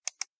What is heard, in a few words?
mouse click